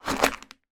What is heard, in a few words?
small card box crush; foley